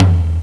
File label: low floor tom (F1); Inside Mac Games; X-Mas Lemmings Demo; Music